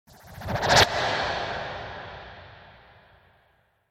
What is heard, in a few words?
Magical Impact Whoosh; Magic Sound Effects